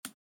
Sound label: mouse down